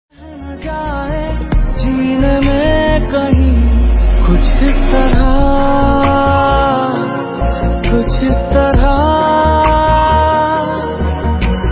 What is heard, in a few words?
new Hindi ringtone